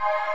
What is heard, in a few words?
Success Section